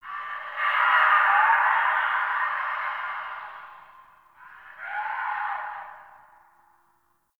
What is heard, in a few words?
Distant Infested Screams Winter D; Warframe; Ambience; NineteenNinetyNine